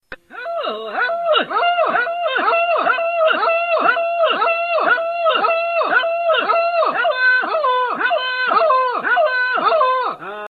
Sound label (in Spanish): Grito